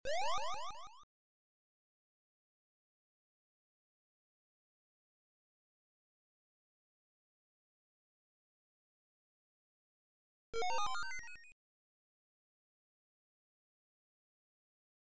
Music Box

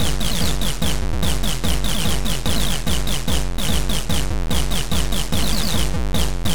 BEAT

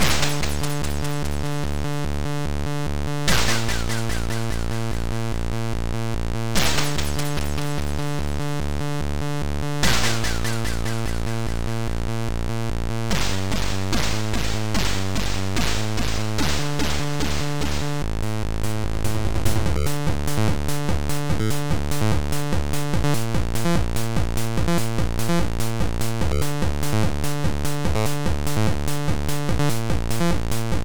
Technojazz

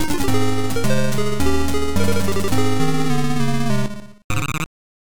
Game over; Sheepish

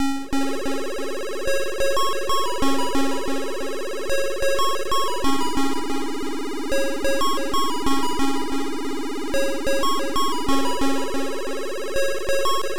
BASS 2

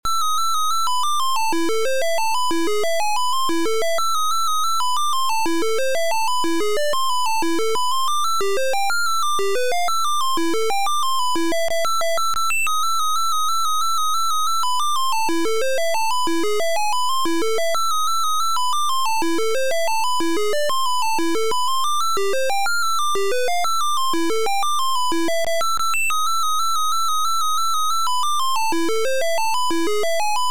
MELODY

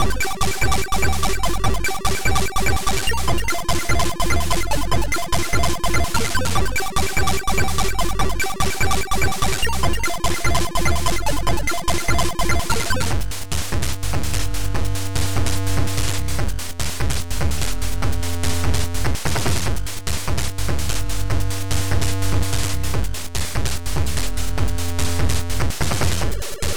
BREAK

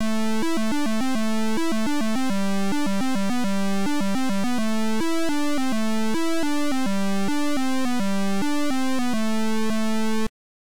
Title Beeper; Star Quake

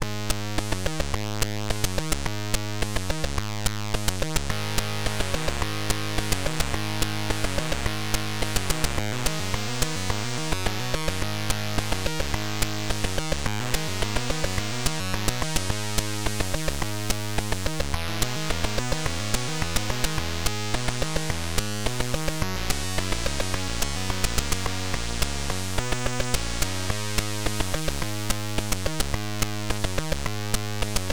bass remix, beeper; Jangler

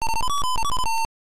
Title Beeper; Skool Daze

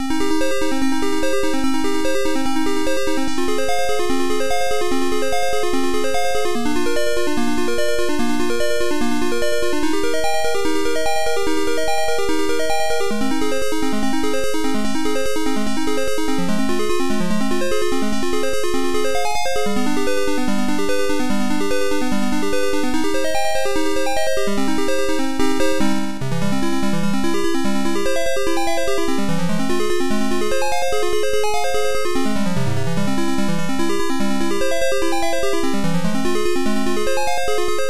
MELODY

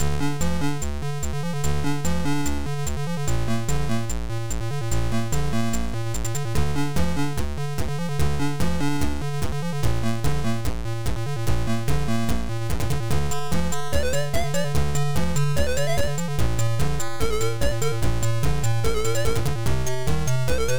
TECHBEAT

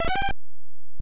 Title, Beeper; Rana Rama

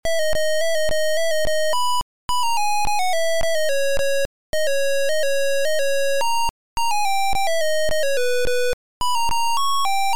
MELODY

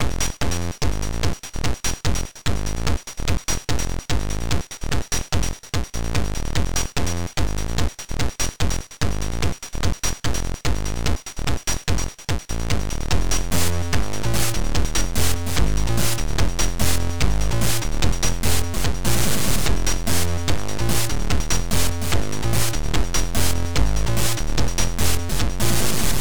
TEKKNO; IMP

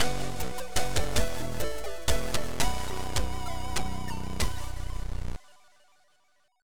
Game Over; Vradark s Revenge

